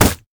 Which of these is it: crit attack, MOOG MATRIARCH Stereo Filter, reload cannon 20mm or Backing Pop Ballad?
crit attack